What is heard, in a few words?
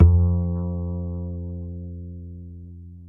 acoustic bass vib F2; Masterbits CLIMAX 7 - Bass